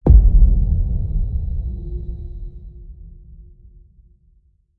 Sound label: Punch Hit Sub